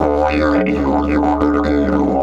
108, Didgeridoo

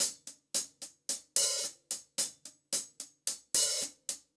Hi-Hat Groove; Sonic Foundry (Sony Creative Software); Vital Drums

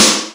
Snare Rev Rev, Korg Snares; Korg 05R-W

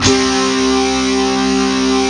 JV HEAVY DUT, JV-1080; Syntec; Wall of Sounds

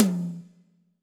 tom, drums; Yamaha SY85

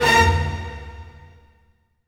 orchestral hit; Orchestral Loops

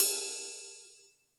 808 kit ride

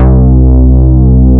MOOG BASS; OLD SYNTHS; MINIMOOG; Rubber Chicken Software Sample Bank

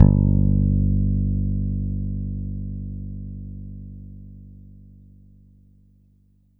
Bass Analog & Synth; USB Soundscan vol.12; AKAI; CURRENT

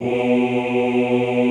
OOH B1, Classical Choir; Best Service; Extended Classical Choir; OOH MIX

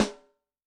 Phly RiM, Snare; Yamaha DTXpress-II

